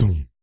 perc voice, Roland MC-909

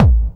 techno 2, bassdrum; Ueberschall; Techno Trance Essentials; DRUMS; BD TECHNO1

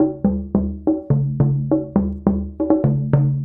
Mallet Percussion; Sonic Foundry (Sony Creative Software) - Essential Percussion

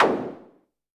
clap, Novation Bass Station 2

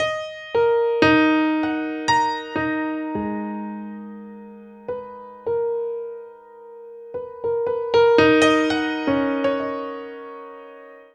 Piano Solo, Cinematic Funk; Foundry (Sony Creative Software); Cinematic Funk Urban Tone Poems; Solos

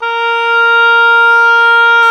OBOE, mf f; Roland L-CDX-03 Disk 1; Oboe 2&3

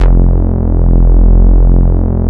OBXA F2, Synth Bass

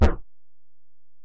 Bell, Alesis DM-PRO

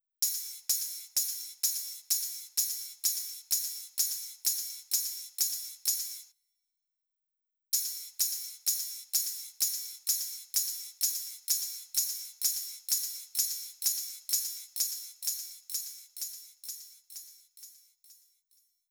Drum Loop Ride, 128BPM; VEC5 Drumloops; VEC5 Multi Loops 128BPM; Loop Elements

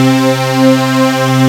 Aud Synth C4; Digital Sound Factory Xtreme Lead

